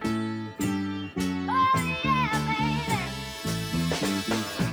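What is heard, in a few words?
Loop pitch shift up a third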